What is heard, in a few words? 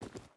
footstep boots forest walk; Rust